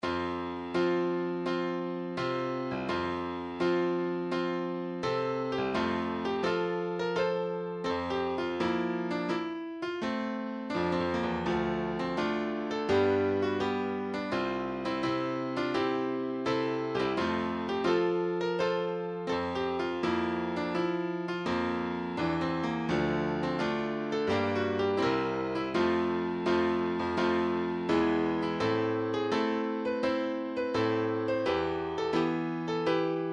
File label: piano